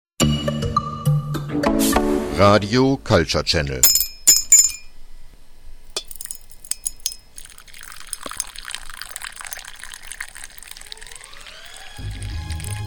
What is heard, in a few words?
jingle intro; RCC Teatime